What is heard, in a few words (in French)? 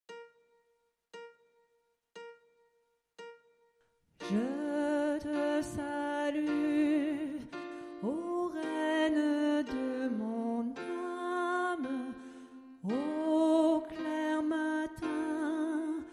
alto